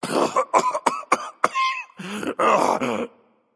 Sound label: clear throat, voicepack; smite; jorm